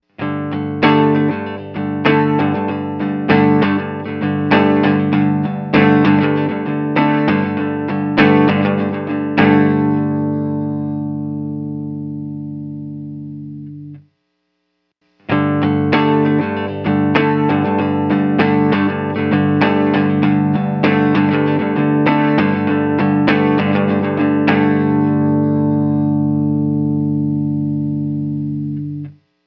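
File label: guitar compression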